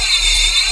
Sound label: Klingon Disruptor Beam